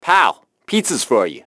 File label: piss